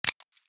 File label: xm8 safety, weapons